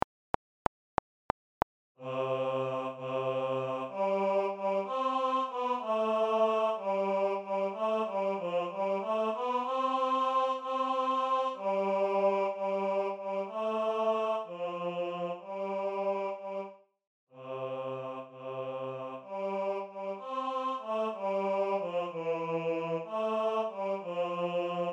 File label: Baritone; Windy Nights